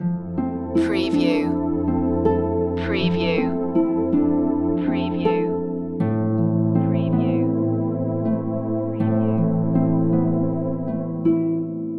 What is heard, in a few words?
slow angels loop